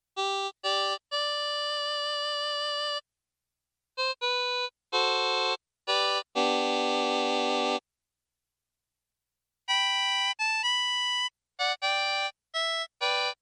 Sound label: inst harp